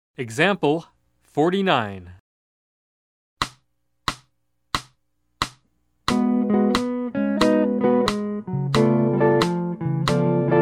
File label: Jazz